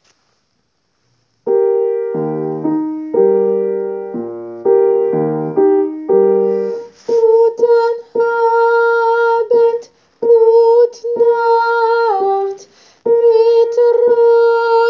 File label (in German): Wiegenlied tenor